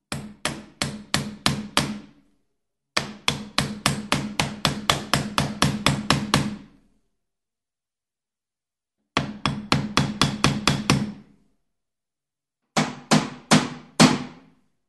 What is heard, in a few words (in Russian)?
stuk molotka